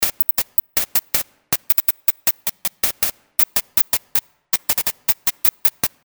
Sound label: Loop; Best Of N2O Library; Slickety Tekity Loopity